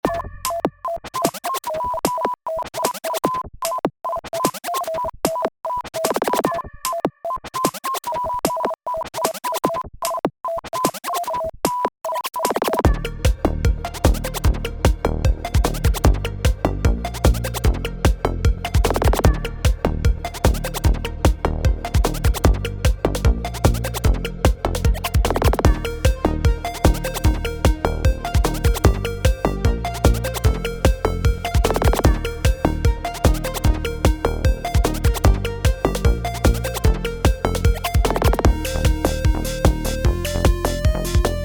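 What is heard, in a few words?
instrumental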